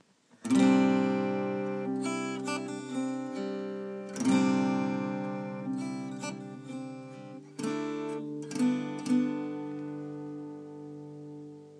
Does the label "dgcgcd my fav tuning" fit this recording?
Yes